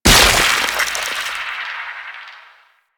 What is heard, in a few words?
Assassinate Fusion End; Warframe; Infested Eris; Encounters